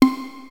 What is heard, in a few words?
Access Virus B; Synthworx